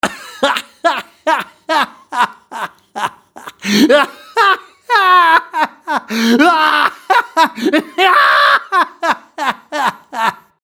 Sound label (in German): irres lachen man